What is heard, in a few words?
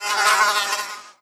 Fly; SES Animals SFX